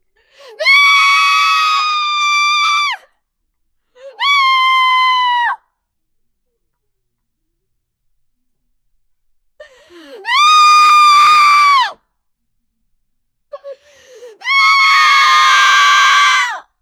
a woman getting smacked; violence

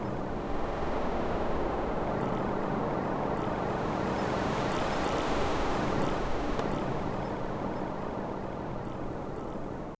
a vast dark mountainside, mountain

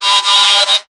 a mech robot dashing

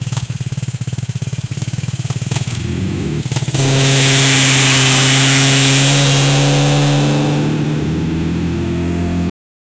energetic motorbike engin

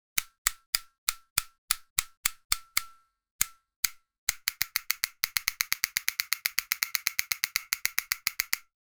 extreme close up mp5 shot, machine gun

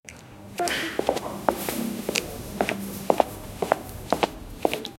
lady cat walking footstep; laboratory

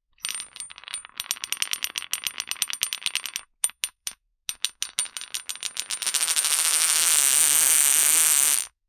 a bolt and nut spinning; loose

